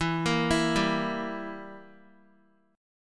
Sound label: E Diminished down Guitar; Edim arpeggiated; JGuitar